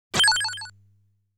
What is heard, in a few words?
Item Unit Destroyed